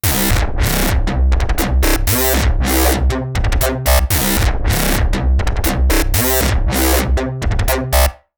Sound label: Roland Gaia2 Bass